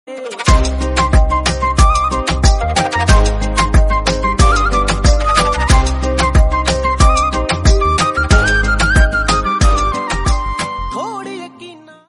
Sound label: Instrumental Flute